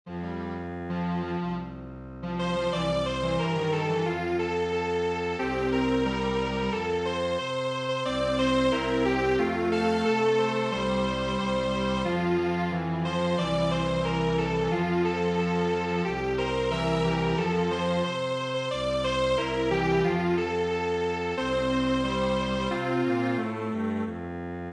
cheerful Child German Strings Quartet

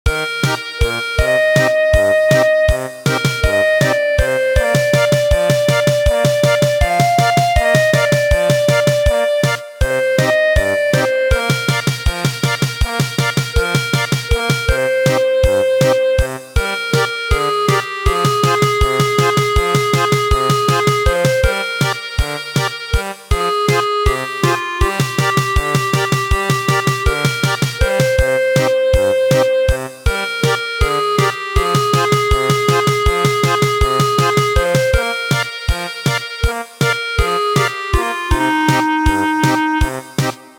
German Polka, traditional

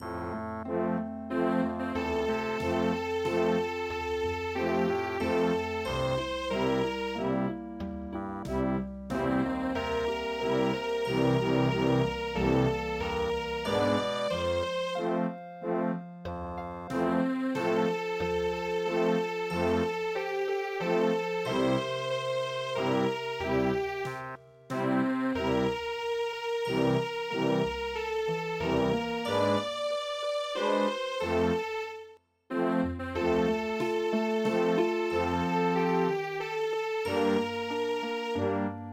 WinerWaltz

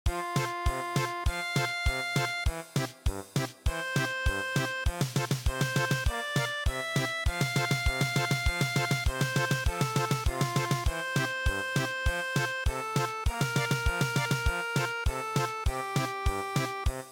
German Polka, midi; Hark hark the lark

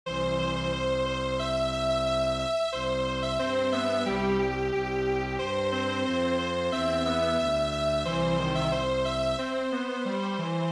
Strings Quartet

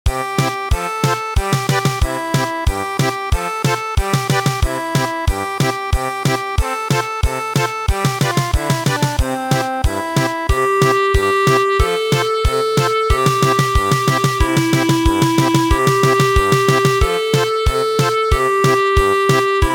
whirling German Polka

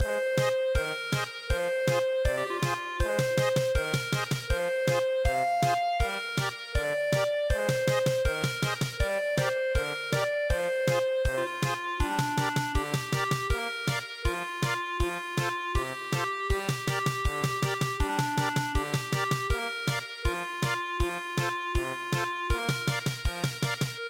German Polka, midi traditional; Wuzhishan ge

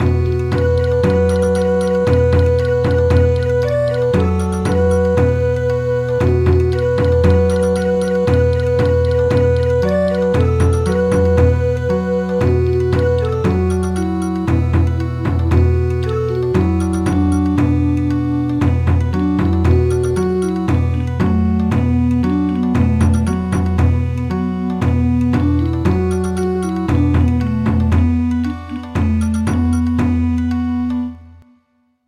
Bon Odori, midi

